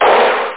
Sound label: another explosion; drwho